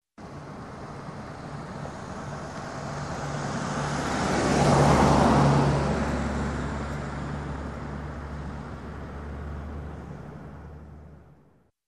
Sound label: Big SUV passing by slowly